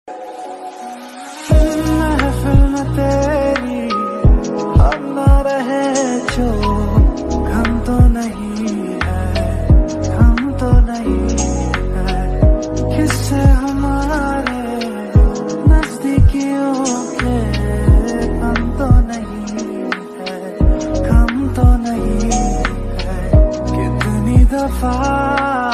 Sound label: slowed reverb lofi ringtone